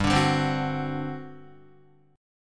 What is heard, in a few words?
G Major 7th Suspended 4th, G, down, Guitar; GM7sus4 strummed; JGuitar